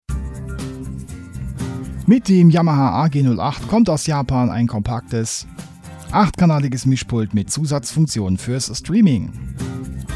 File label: yamaha ag08 ducker short